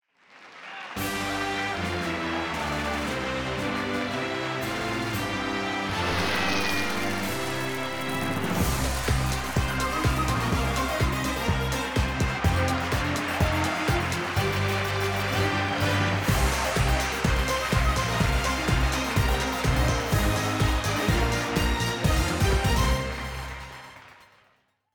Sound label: Intro Fanfare; buzz brain of the uk 2009 ps2